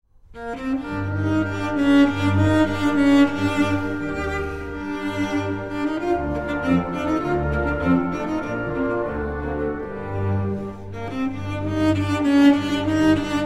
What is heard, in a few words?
Rondo For Cello and Orchestra, G Minor; Dvorak; Op. 94, B. 181